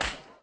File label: slap